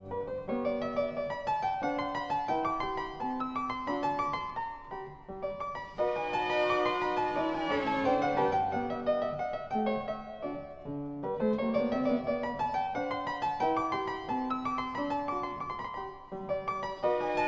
piano concerto no 17 in g major allegretto; Mozart; K. 453

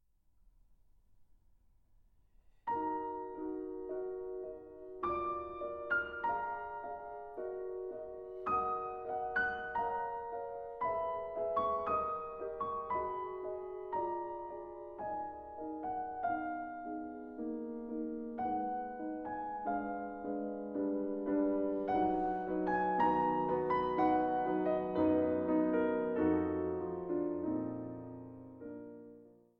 nocturne in e-flat minor

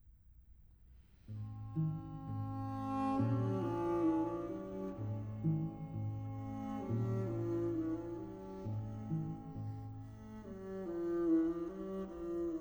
quatuor en la mineur allegretto quasi moderato

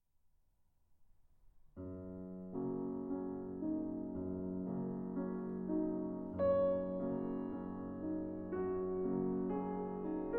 ballade in f sharp major

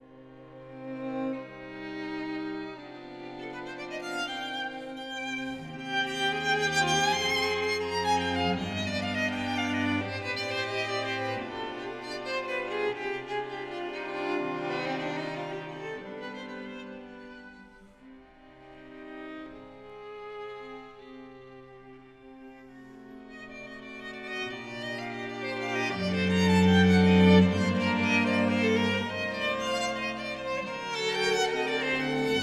string quartet adagio

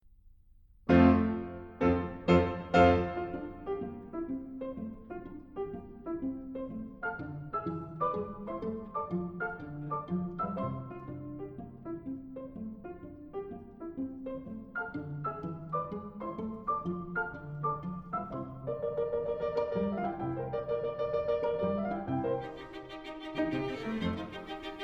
trio en fa majeur scherzo presto; Saint-Saëns; trio n. 1 op. 18; 3. scherzo

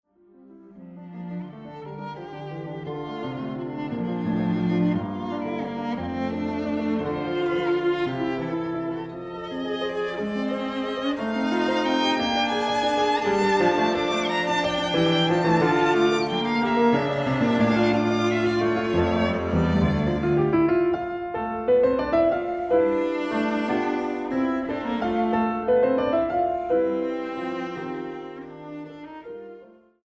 trio allegro ma non troppo; Fauré; Trio op.120

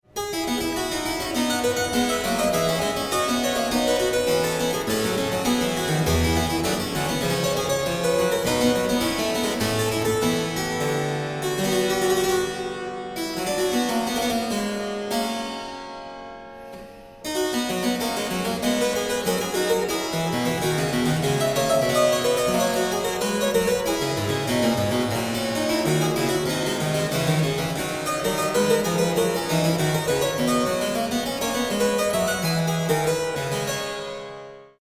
toccata; Froberger; toccata IX

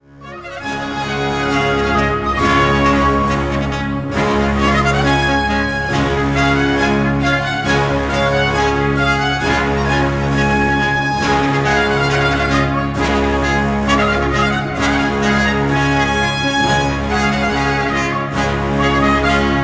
toccata; L'Orfeo SV 318; Cappella Mediterranea; Leonardo Garcia Alarcon

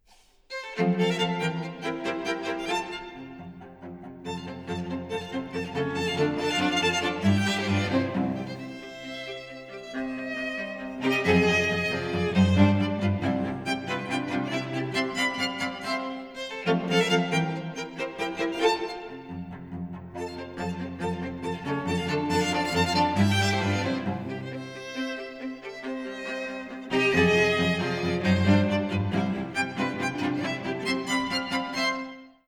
string quartet menuet presto

